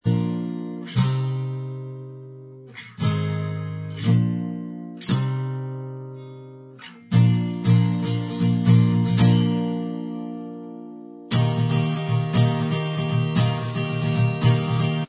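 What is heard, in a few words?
acoustic style instrumental Track, backing tracks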